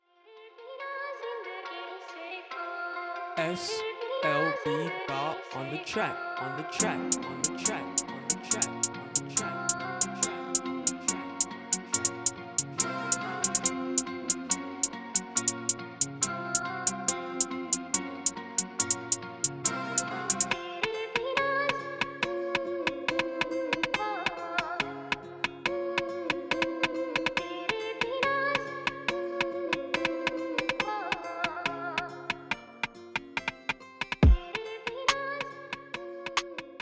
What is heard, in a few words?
Drill